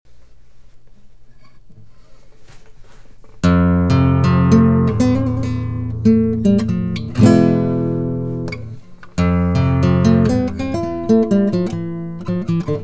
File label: composite spanish guitar